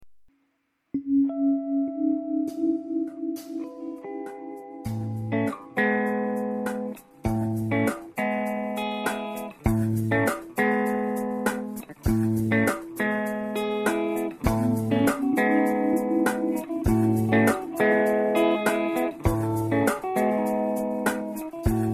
Musique Jour

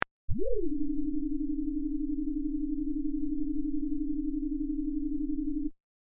decollage

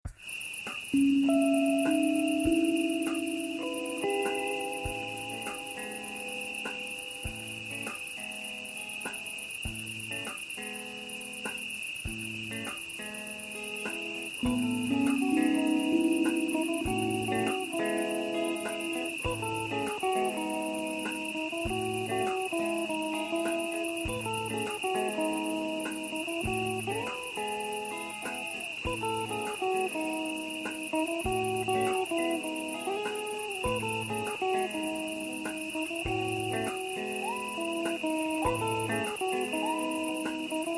Musique Nuit

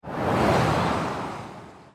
vent; rollercoaster

